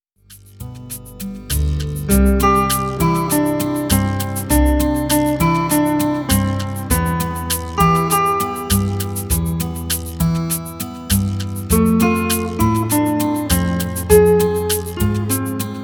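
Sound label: guitar and piano, BACKGROUND MUSIC FOR VIDEOS; guitar and piano demo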